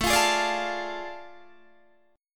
A Major 7th Suspended 4th Sharp 5th, Guitar, Standard; AM7sus4#5; strummed; JGuitar